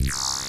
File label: Synth SE